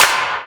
soft hit clap; osu

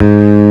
bass; osu

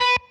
Staccato

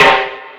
soft hitclap

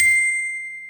normal hit normal

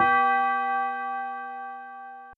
bell B4; osu

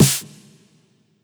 normal hit whistle

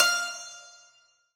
Santoor F4

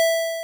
synth E6; osu

